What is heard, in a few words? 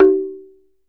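Tongue Drums, high; E-MU Producer Series Vol. 7 – Old World Instruments; Pitched Percussn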